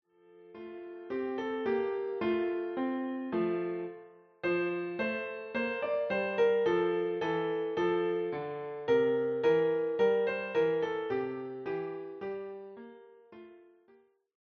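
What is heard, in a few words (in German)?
Lasst uns froh und munter sein, Klavier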